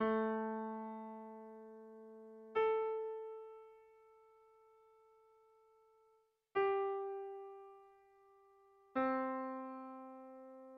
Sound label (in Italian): Dettato Melodico